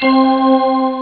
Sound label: heaven, synth chords